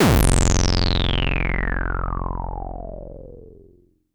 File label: Synth Bass; Basses